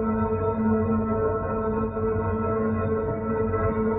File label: Tonal Re Draw, sparse soundscape